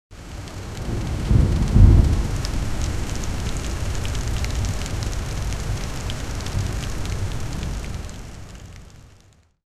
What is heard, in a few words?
rain on a ship